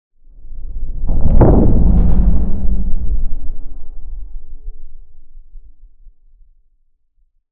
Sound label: impact underwater or space quakerish; lukas tvrdon